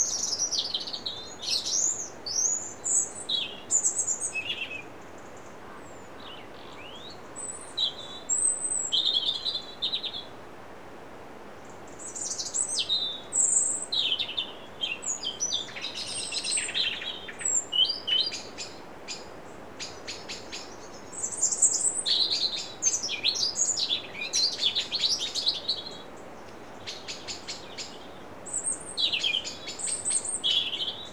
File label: Dawn Chorus; Song Meter Mini 2 Li-Ion